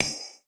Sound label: Magical Rock Mine